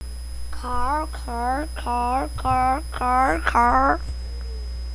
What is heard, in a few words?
car